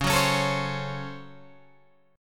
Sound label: C sharp Major 7th Suspended 4th Sharp 5th, Guitar, Open G